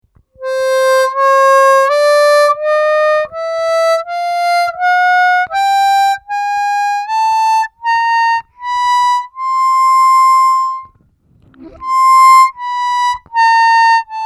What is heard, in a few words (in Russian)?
Хроматическая гамма до мажор, губной гармошке; +4-4′-4+4o+5-5+5o+6-6′-6+6o-7+7